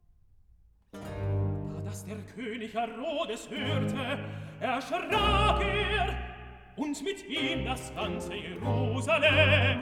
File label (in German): Recitative, tenor; Evangelist; Da das der Koenig Herodes hoerte